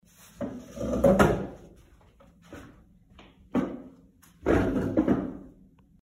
sadyatsya za stol

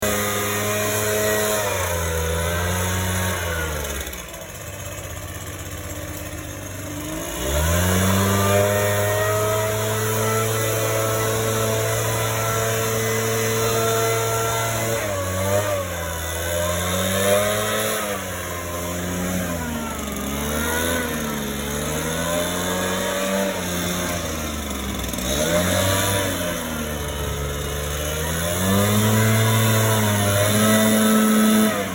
zvuk vozduhoduvki list

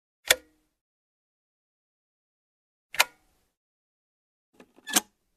zvuk vykl tumblera

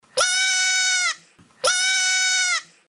zvuk krika kozlenka

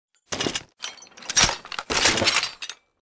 perezariadka minigana

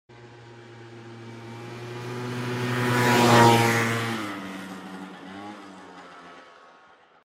moped proehal

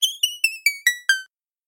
game over na telefone

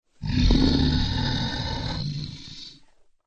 tihiy ryk dino

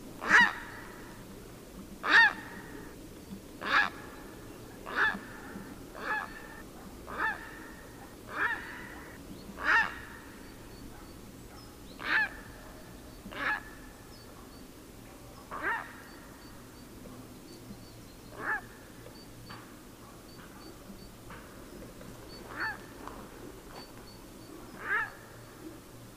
caplia

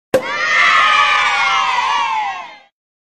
zvuk polucheniya donata

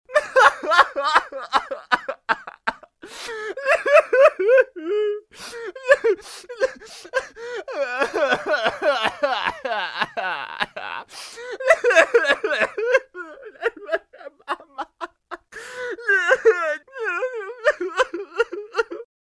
Paren pritvorno plachet

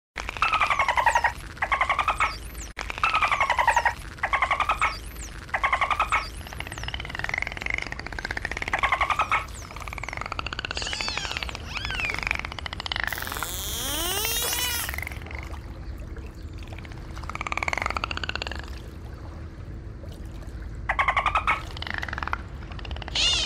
smeh delfinov

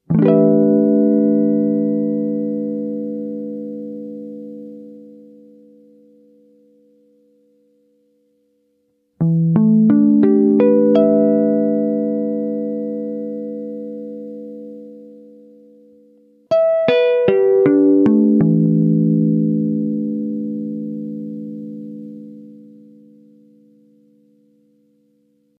trinchanie gitary

